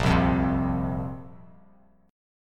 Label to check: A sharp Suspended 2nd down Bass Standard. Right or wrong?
Right